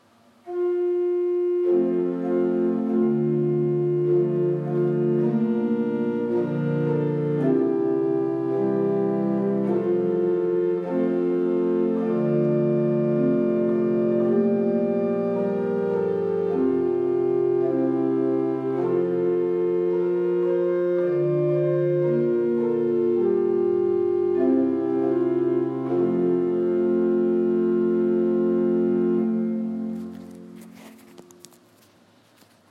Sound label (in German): prinzipal 8, orgel